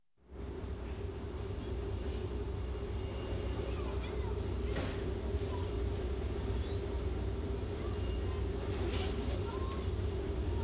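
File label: Diesel Trains Station atmosphere; BBC Sound Effects Library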